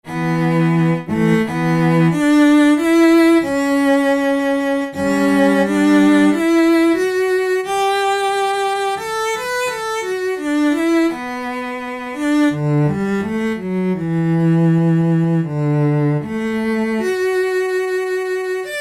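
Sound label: Strings Cello Arco f vibrato; Roland L-CDX-04 Strings And Orchestral Percussion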